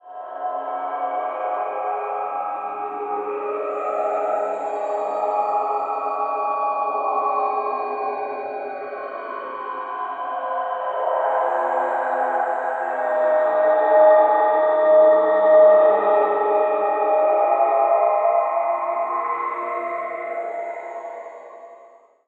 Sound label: horror, ambience drone